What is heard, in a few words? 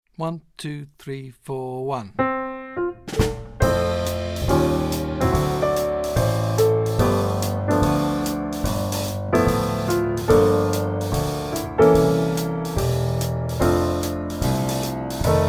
melody with left hand chords; teclado; jazz iniciante